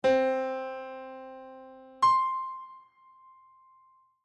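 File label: music intervals perfect fifteenth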